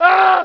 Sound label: SCREAM